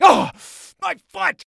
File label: Voices weightlifter scream